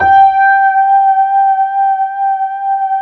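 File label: reverse piano G2; AKAI S-Series CD-ROM Sound Library VOL-2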